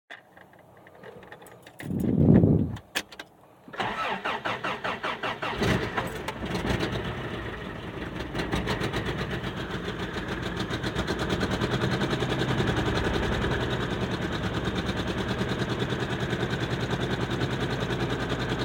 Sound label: sudden severe misfire 88 22re truck